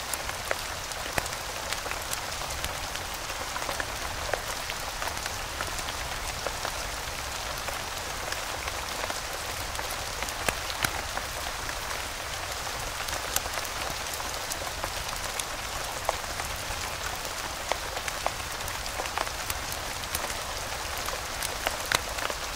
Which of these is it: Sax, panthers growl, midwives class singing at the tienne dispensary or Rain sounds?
Rain sounds